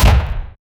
single bullet impact sound; shooter

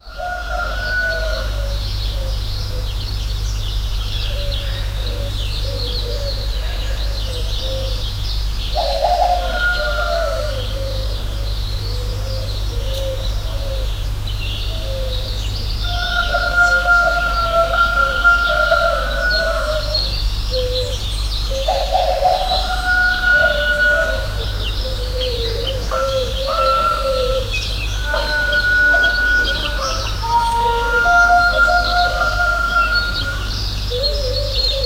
a peaceful village early, early morning

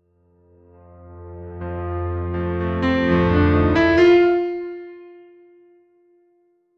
tonal piano rise, trailer

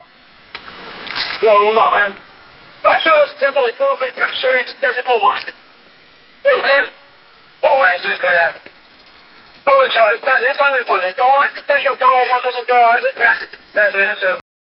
sounds of struggle as pol, law enforcement

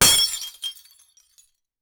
glass shattering

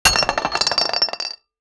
a sturdy rhythmic sound

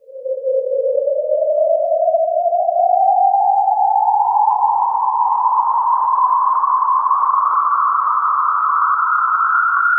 space flight

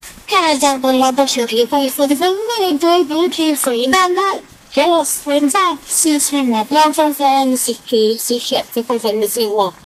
japanese gameshow correct

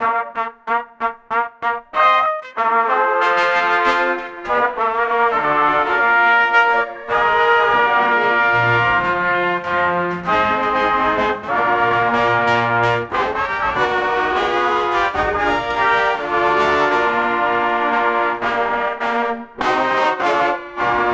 brass heavy military, brass

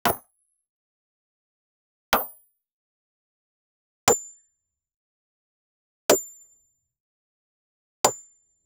two arrow shots; mmorpg

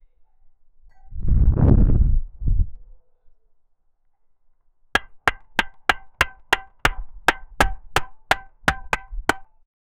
hammering sound for repai, tool

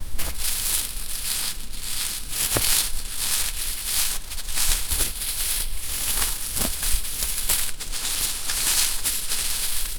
plants

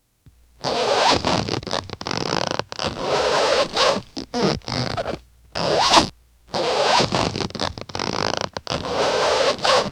deep harsh rope friction; fabric

